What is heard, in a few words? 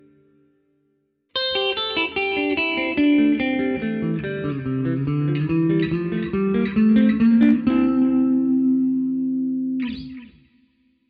Melodic, guitar